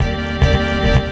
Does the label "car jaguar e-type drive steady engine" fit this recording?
No